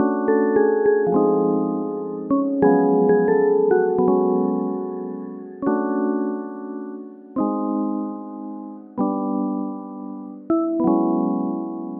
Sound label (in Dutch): Tweede versie melodie